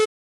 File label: tic long; libgdx